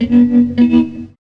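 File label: guitar tone; E-Lab; X Static Goldmine 1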